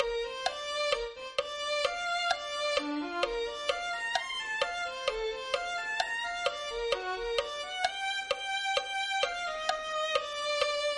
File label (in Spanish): te alabare oh señor mi dios violin